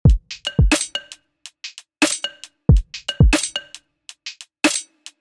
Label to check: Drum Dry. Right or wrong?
Right